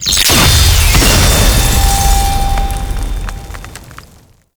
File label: Blast; World Seeder Descent